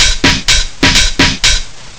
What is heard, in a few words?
beat